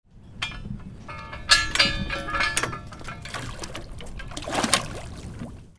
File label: BOATS